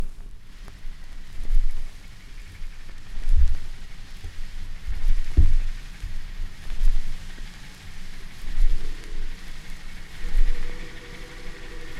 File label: K4s, Steam Sounds; Floral Park; G5; Mineola